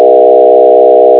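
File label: chirp; carson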